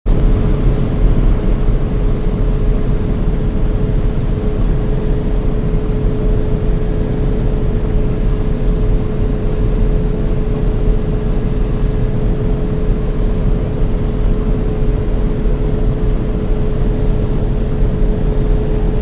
Interior Point of View, Forklift Startup With Chugs, Run Steady With Brake Groans And Turn Off.